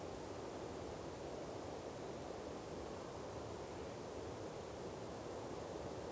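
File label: ambience Light Wind; Star Wars Obi Wan; XBOX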